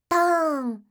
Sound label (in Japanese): doonn